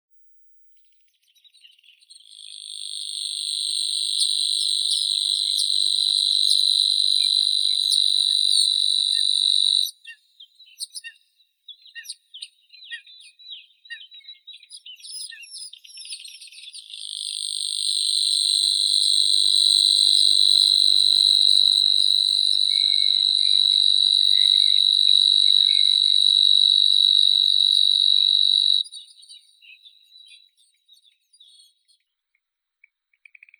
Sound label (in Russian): zvuki prirodi sverchok solovinii locustella luscinioides